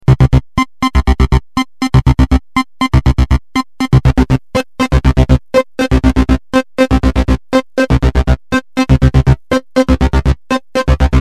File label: flanger